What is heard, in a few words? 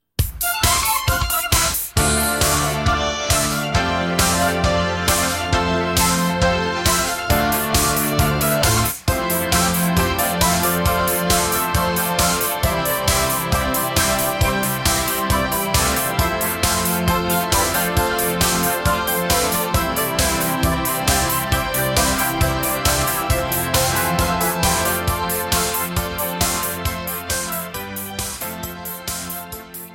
80s pop song moving synth bass piano melody in the background roland